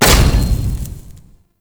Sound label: Scrap Container Open; Warframe; Missions; Zariman; Zariman Assassinate